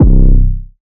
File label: 808 stick C, Tonal; Hip-Hop; Slate; CAICOS Hip-Hop Sample Pack